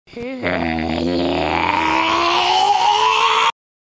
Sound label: grandma howl